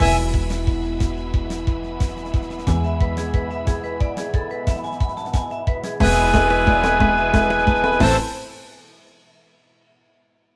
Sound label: hard boss stinger